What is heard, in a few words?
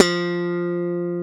CHOP F2 X, Bass; AKAI S6000 CD-ROM - Ultimate